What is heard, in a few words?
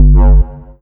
Horn Womp